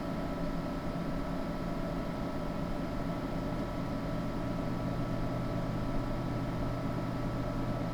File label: Desktop Computer